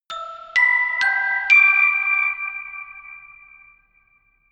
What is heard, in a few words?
notification glockenspiel creepy sequence jump